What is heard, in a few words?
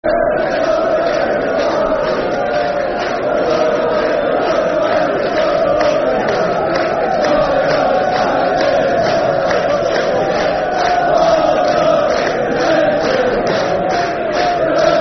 Football Chant (Soccer Songs); Celtic; fanchants-com